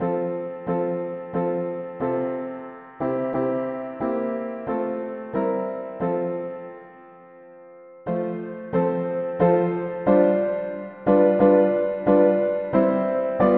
mono piano, cantiques; hymns; Jour apres jour Seigneur

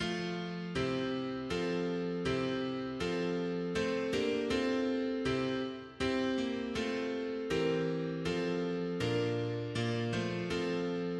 mono piano, cantiques; hymns; Oui le souverain bien etre

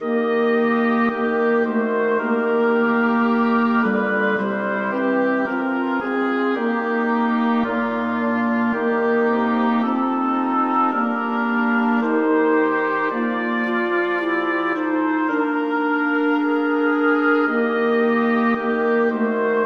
polyinstru, cantiques; Qui peut sonder tes profondes pensees; hymns

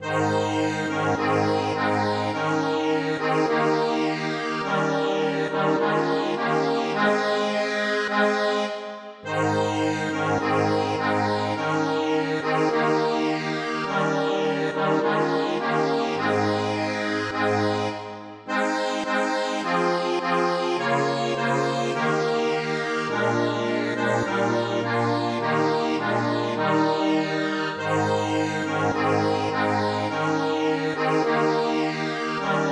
Nul enfant nest trop petit polyinstru; hymns

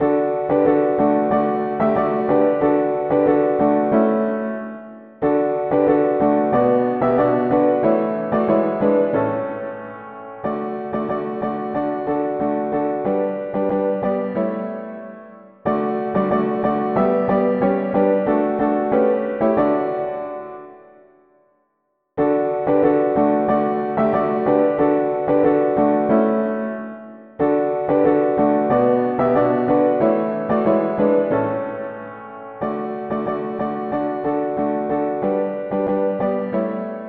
mono piano; Le signal de la victoire; hymns

